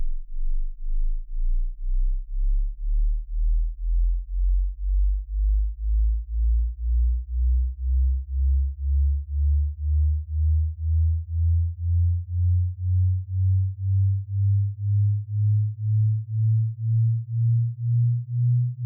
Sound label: offset sweep 20 120